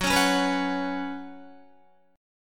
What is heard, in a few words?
F sharp Suspended 4th, F sharp, Guitar; strummed; 13,16,13,16,13,14